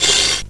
Breath, Jomox Xbase-09

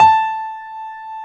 Piano A5; Best Service Gigapack 1

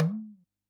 TABLA, PERC

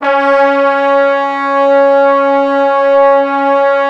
C#3, Orchestral Brass; Best Service ProSamples vol.20; AKAI

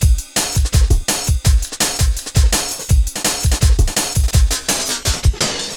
Programmed Stepz, Jungle Drum Loops

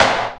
SD, dance drums hip

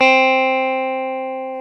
GTR, Dual Guitar; Club-50 - Foundations Roland

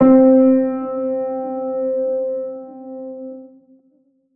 Piano - C; Instrument Oneshots; 99Sounds; Monosounds

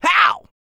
yell, male human, R&B dance vocal

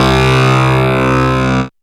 bse c, Rave Bass; Dance Synths

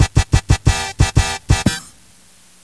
Horn Hit Loop; Sonic Foundry (Sony Creative Software); Crimson Blue and Fabulous Horncraft 4 RnB; PhatTrax

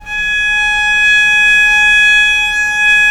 G#4, Historical Instruments, VIOLE; VIOLE 2V; AKAI; USB Soundscan vol.23

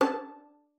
FING A5, VIOLIN; Xsample VOL-5

